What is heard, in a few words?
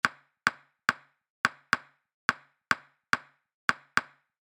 Clave